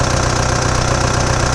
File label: golf, Vehicles